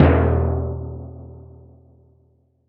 Timpani, Percussion

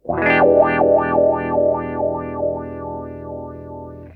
WOWOWA, Funk Guitar; Zero G - Funk Guitar

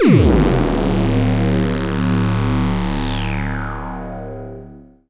Lucky glide; Keith Emerson Signature Series

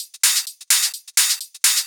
Hihat Loop 128 BPM

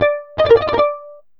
romantic, signals; AKAI; FX SIGNALS

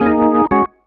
ORGN 123, Keys; Houseworx

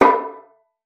VIOLIN

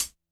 hihat; Big Fish Audio; Hardcore Breakbeat Action; Mellow Jack

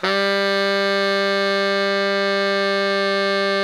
baritone FF G 2, Sax; Giga Samples Collection

